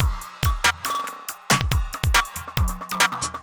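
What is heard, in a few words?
industrial 140 kit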